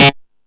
Killer Synth, E-MU Pro-Cussion; drum machine

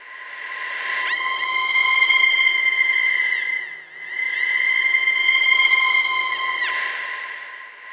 CRYING OUT, Human; Distored Reality

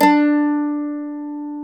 MANDOLIN; E-MU Producer Series Vol. 2; More Studio Essentials; Composer

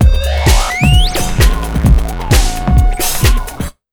JUMP 130, Big Beat Drumloops

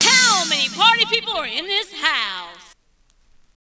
Vox, Trance; Sonic Foundry (Sony Creative Software); Trance NRG VOL-1

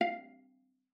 VIZP F5, VIOLA PIZZ; Xsample VOL-5

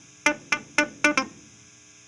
Cruisin' Disco Clavinet, 70s Analog Funk

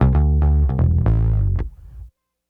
Disco Bass, 70s Analog Funk

